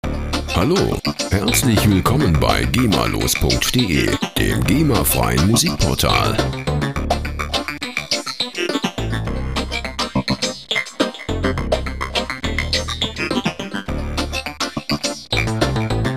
clavinet

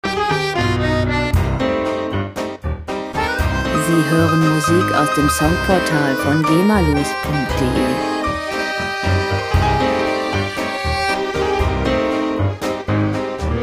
tango argentinia

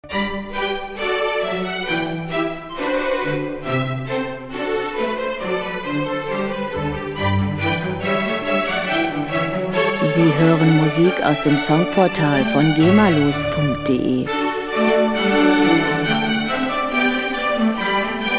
gavotte

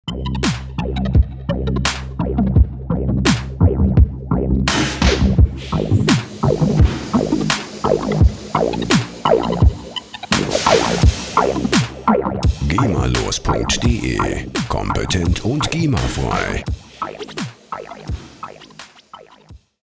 rhythm

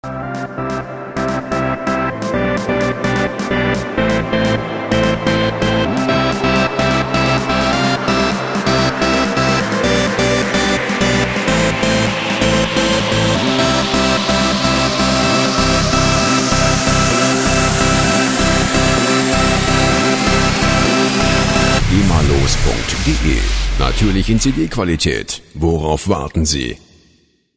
edm party

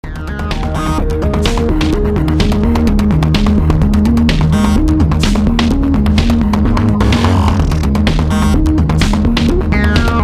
rhythm

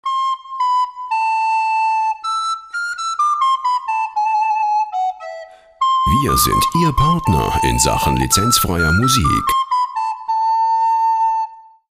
recorder

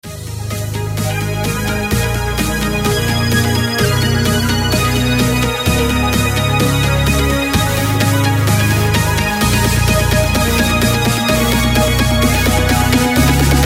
electro bass kickoff

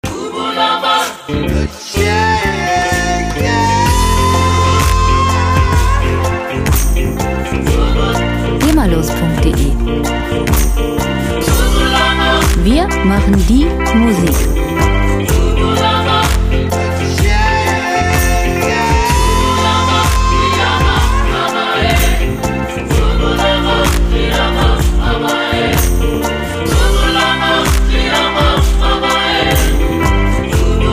west africa melody

